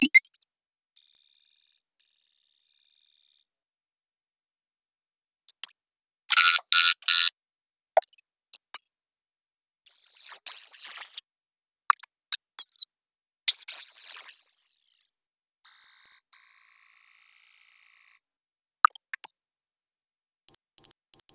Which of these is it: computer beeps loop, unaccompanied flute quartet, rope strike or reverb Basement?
computer beeps loop